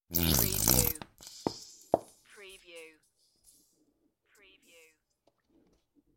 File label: Squeezy Honey Bottle; beanstalk audio